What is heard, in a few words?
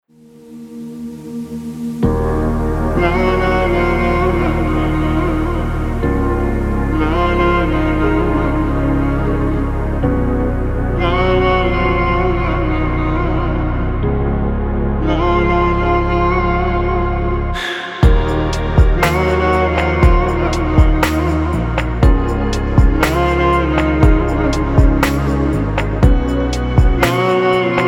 pop music